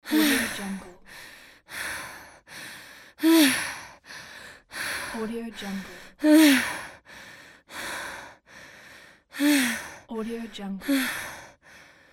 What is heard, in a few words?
woman breath heavy